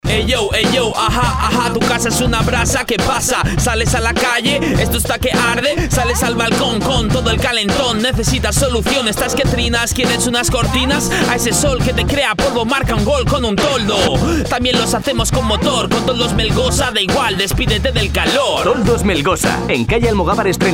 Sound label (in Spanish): rap; creatividad radiofonica